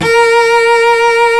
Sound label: Arco Cello A#3; Proteus 2000; Digital Sound Factory Proteus 2000